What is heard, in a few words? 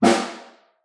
SN1 Far, Snare